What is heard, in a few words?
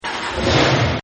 Dumpster Short Dump; Debris; Sound Effects:Materials; Partners In Rhyme